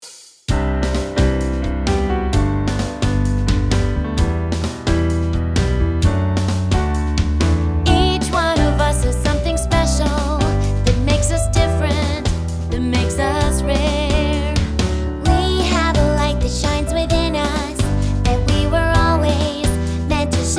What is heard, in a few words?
Piano; My Little Pony Friendship is Magic